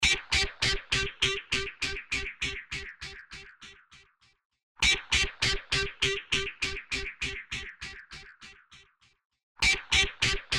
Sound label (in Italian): chitarra